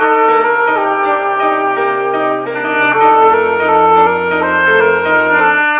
sax and piano, Sad; loops

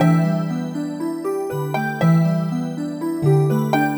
bell pattern; Loops; Electronic

